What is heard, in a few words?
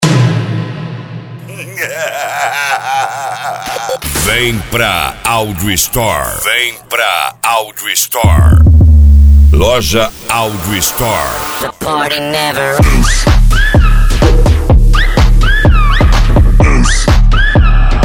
Electronic Music